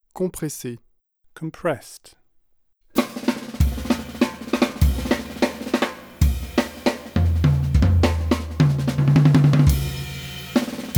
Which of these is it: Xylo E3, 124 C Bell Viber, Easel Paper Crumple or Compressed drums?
Compressed drums